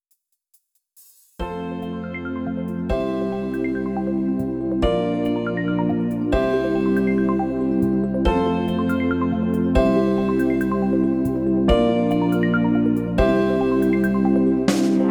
Beach intro; cupid kiss cute puzzle windows gamerip 2022

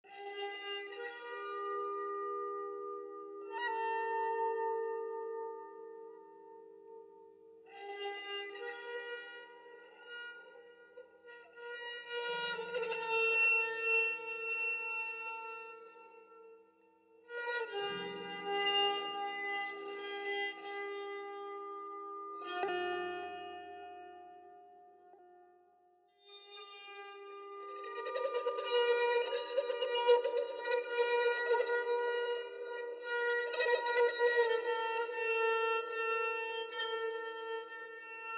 Bowed Guitar Thematic No Pop; the last of us

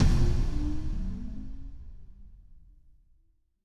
Music Wave Start

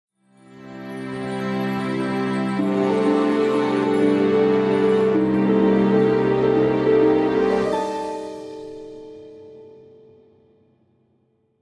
world map melody; Might and Mayhem

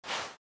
Fly; Troll Face Quest Unlucky; Android

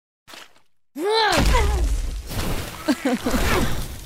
Standing Heal Trample; Goddess of Trampling

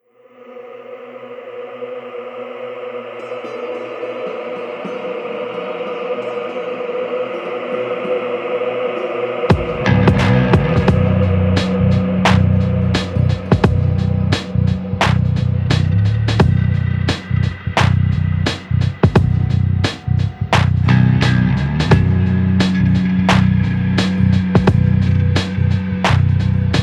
Caves (Lofi); Diablo Lofi Beats

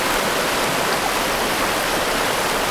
Sfx Waterski; Levels Hub Bayou; Twitch Waterski; Sfx Waterski Wake

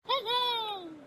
Sheepbird

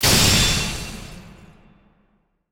Cardbreak

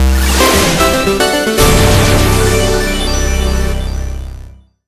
Logo Music

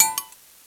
Computer Shop Choose Category

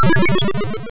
Recycle; Gravitar

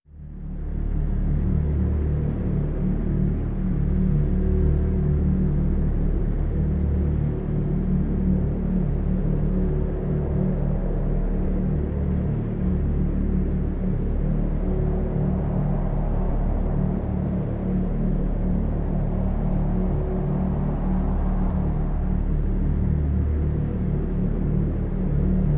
ambient cutscene outro

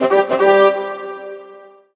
mission won; Panda

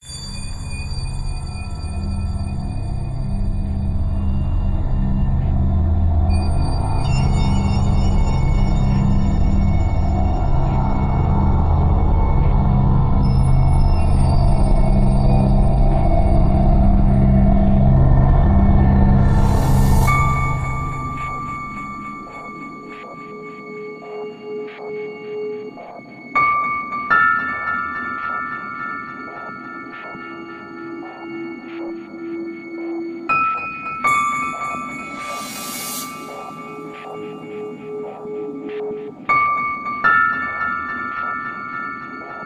BGM; Adventure Player; psp

